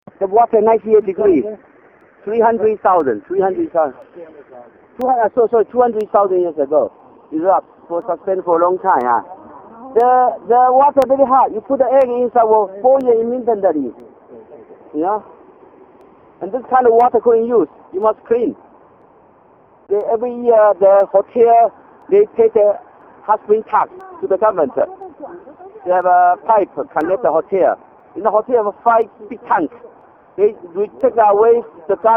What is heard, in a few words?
water china; taiwan